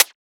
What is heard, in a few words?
Closed Hat